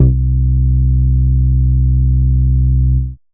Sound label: Moog Bass, Dance Synths; Best Service ProSamples vol.37